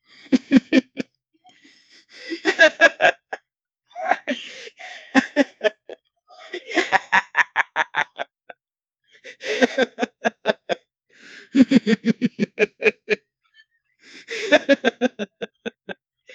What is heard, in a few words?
22 year old guy, laughing